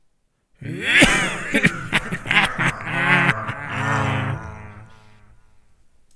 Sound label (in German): lachen